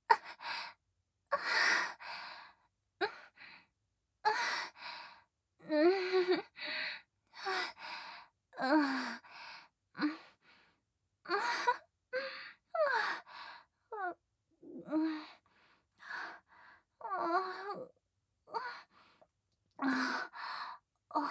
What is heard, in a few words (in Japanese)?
喘ぎ声・普通